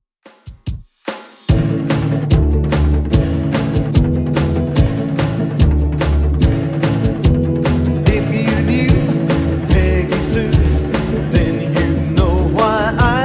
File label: 50s Redrum 146bpm